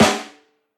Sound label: verby steel snare drum sample a key, acoustic snare sample tuned to the A note